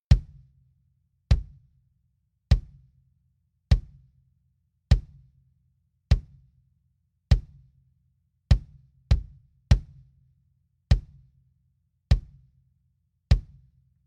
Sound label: kick dry